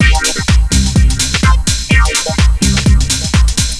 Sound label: HOUSE INDOOR; Neocron